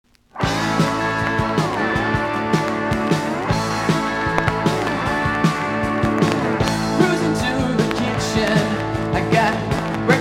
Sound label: Rock & Roll; Snap Crackle and Pop